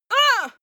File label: female hit; soundpool